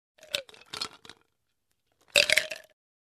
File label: Filling Ice Cubes in Drinking Glass